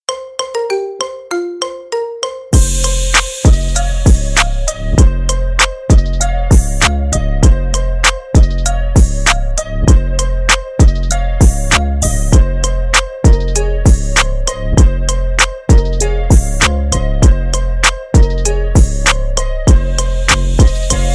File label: marimba remix